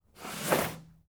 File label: slide by books